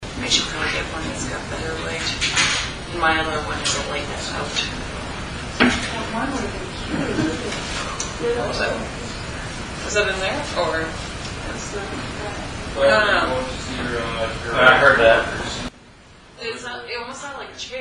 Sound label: dining chair sound; willow creek